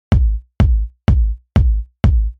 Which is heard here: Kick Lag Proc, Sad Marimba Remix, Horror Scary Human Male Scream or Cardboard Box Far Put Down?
Kick Lag Proc